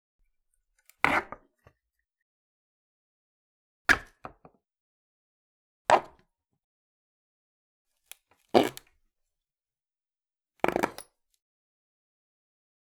Palette All Sounds Spaced, WoodenPalette

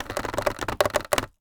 Polystyrene Creak

